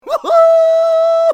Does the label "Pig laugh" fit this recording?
Yes